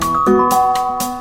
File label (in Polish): prawidlowy klocek